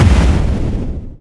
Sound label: se explosion; love2d